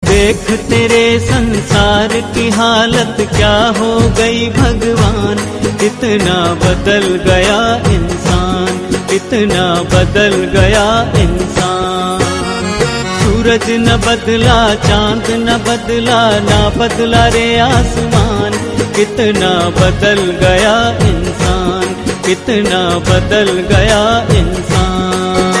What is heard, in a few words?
hindi; nastik